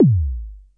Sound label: 909 bass, drum machines